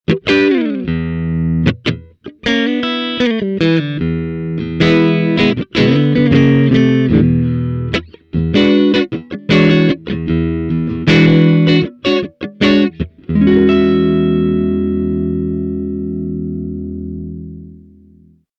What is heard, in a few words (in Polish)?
Czysta, classic strat humbucker